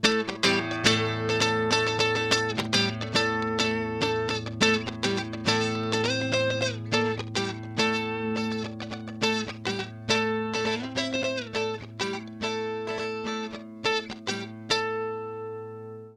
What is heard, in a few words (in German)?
Doubler Gitarre Decent Doubleing